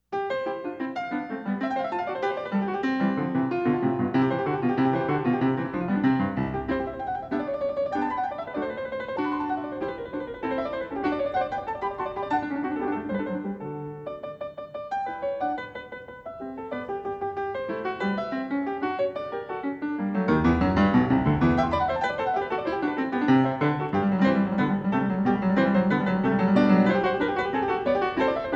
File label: Piano Sonata in C major, Allegro; Mozart